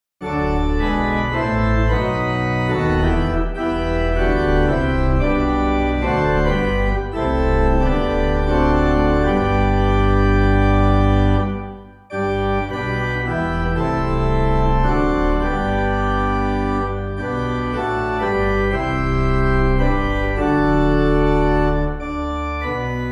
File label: Come Thou Almighty, Pipe